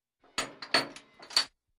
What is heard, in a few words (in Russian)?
Zvuk tanka; Звук танка